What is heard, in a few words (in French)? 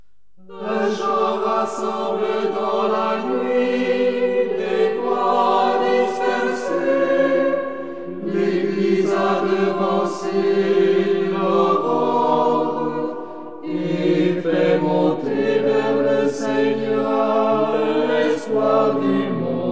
un chant rassemble